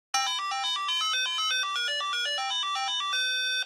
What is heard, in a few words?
start; flutter tetris